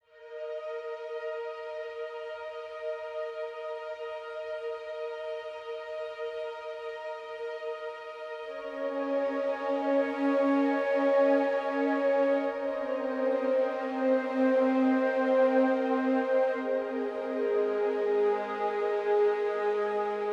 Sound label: Relaxing SPA Music, Sleep Aid